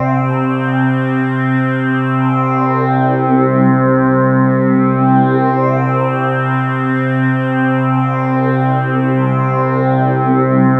Roland JV 2080 LFO C2; MISSION LFO

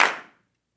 clap, Roland MV8800; REALCLAPS

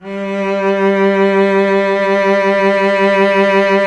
STR VC.G, Roland LA Composer strings; Roland - LA Composer vol. 1

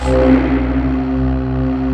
JV JAZZY FLU, JV-1080; Syntec; Wall of Sounds

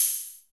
HH HH, Dance

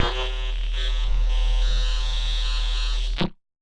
Casio, Glitched, malfunctioning synth

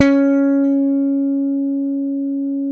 SPC SUS F C#4, Bass; Sampleheads; Will Lee Bass Library

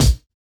BD; Best Service; Dance Mega Drums

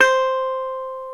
GTR, Dobros, National

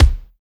BEAT KICK

